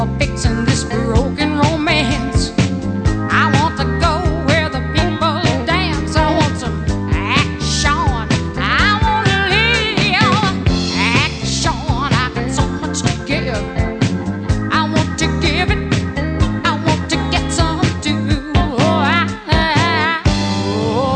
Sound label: disco